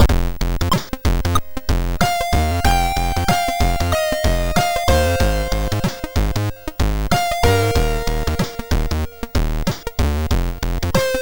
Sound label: Test Polyphonic sid MOS6581R2; Driver 11